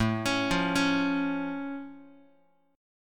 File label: A Augmented A down Guitar; arpeggiated; x,5,4,3,5,x; JGuitar